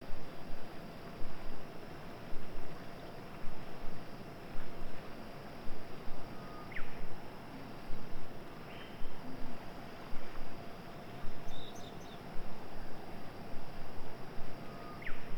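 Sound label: Truck Chase ambience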